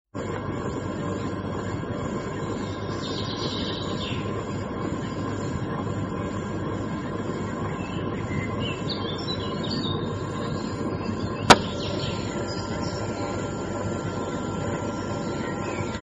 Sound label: Birdsong; germany